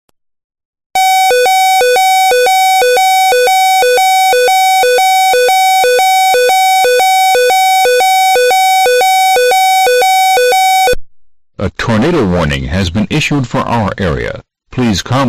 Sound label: Tornado